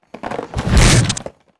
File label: TWEEZER WAR ATTACK